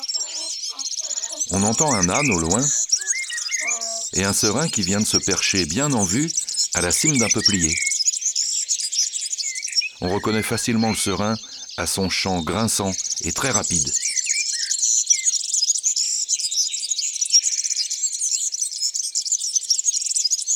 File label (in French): Serin cini; ABC de la nature